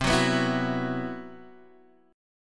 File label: C Major 7th Flat 5th C, Guitar Standard; CM7b5 strummed; x,3,4,4,5,2; JGuitar